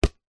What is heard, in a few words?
soccer ball slightly deflated single bounce slightly wet asphalt concrete; sport